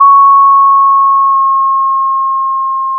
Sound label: pop, Sound of a school bell